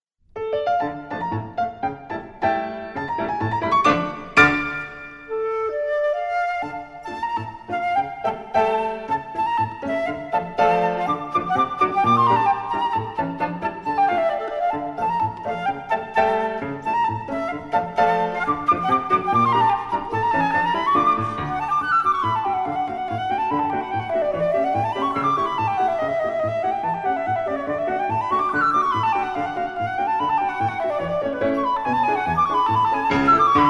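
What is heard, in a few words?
flejta pianino Italyanskaya polka, flute piano Italian polka; Rahmaninov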